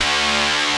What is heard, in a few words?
P5 GUITAR D2; E-MU Formula 4000 Series Vol. 3; Analog Odyssey; VK Instruments